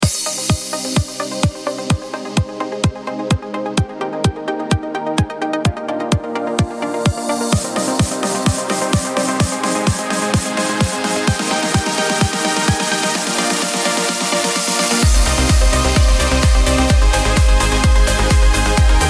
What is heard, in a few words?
zenith euphoria